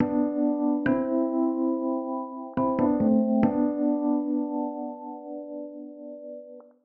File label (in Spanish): Pianos Y Teclados